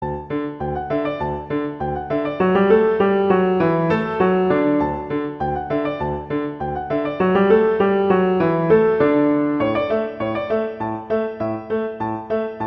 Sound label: Musette; 12 Pieces